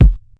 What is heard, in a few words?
classic hh kick; Hip Hop; STYLE KITS; Classic Hip Hop Mega Kit